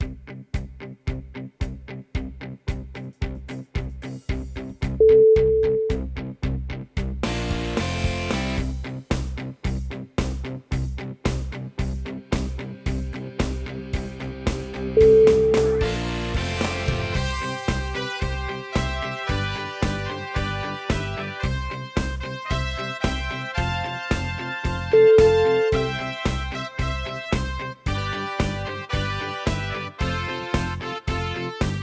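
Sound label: auld lang syne rock, music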